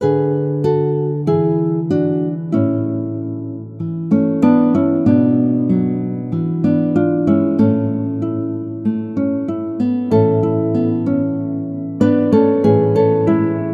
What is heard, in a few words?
guitac